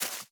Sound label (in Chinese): 樱花树叶破坏声